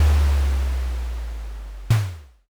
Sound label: castle gate